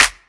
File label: Clap; Equipped Music; Loungin House